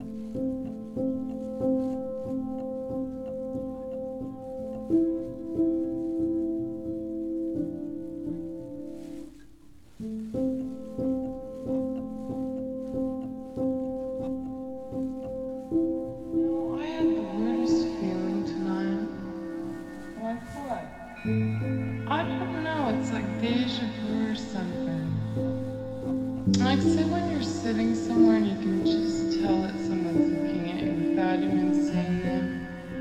Piano Rework

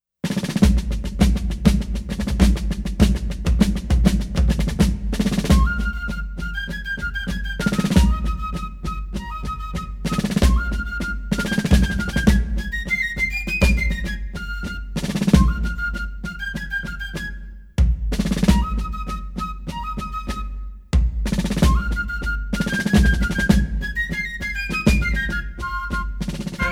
Fife and Drum Corps; The United States Army Old Guard

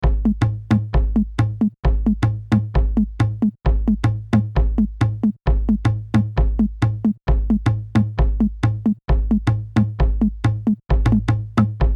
132 tomcat beat; Loop Mania Sampler Pack

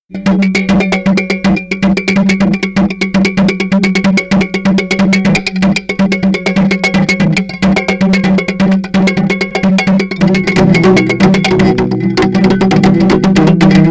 Toy Guitar

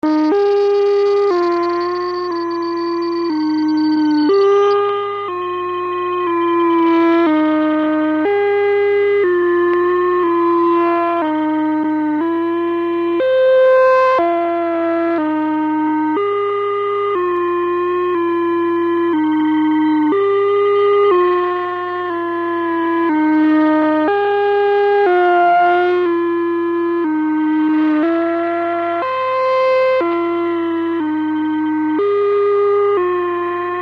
high pitch polytone

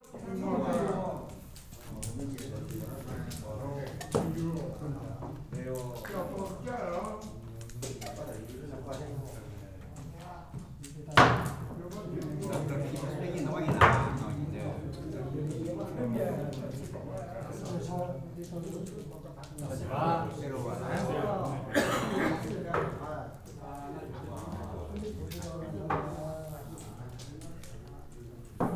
Mahjong players gathering, CHINA; Les Cartes Postales Sonores; NOISE ON EARTH Vol6

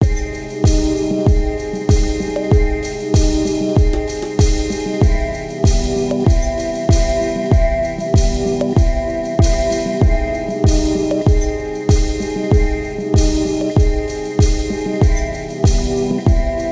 Deep Dark pumping slower dance; CUES for film TV games etc

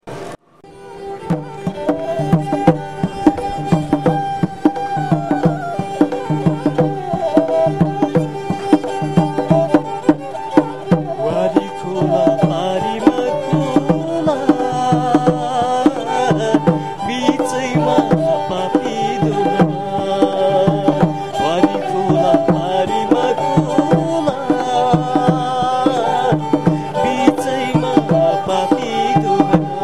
sirangi drum duo, Field Recordings from India; WFMU

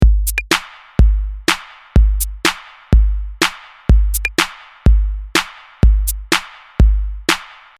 124 simple beat

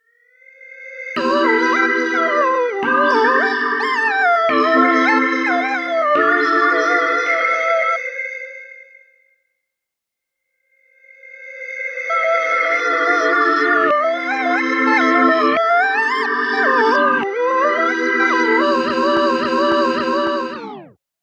playful modern synth; Filmmakers Reference Kit Volume 2

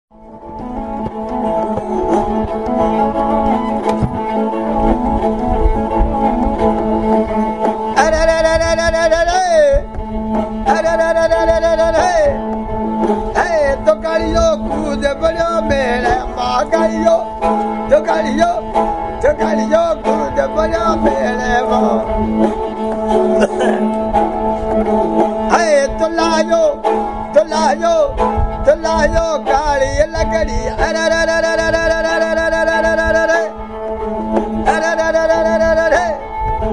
lake Kamayacha, Field Recordings from India; WFMU

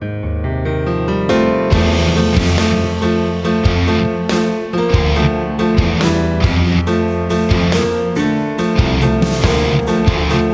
Loud Heavy Dark Anger Confusion Piano Metal; CUES for film TV games etc

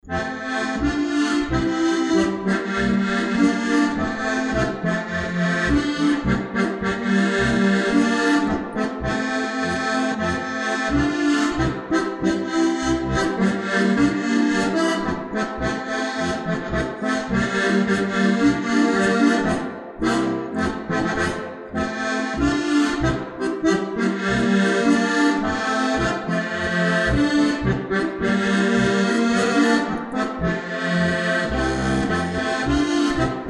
Polka

